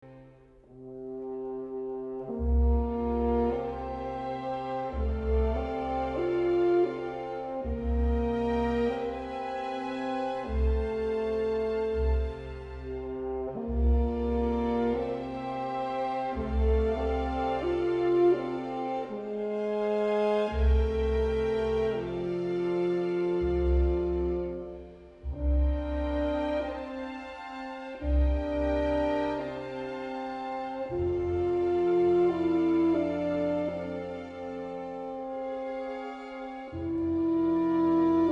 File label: Lullaby